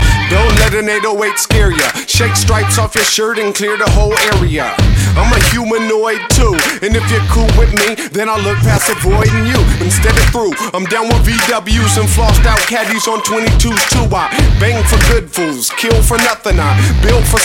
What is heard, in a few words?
Artsy Dubstep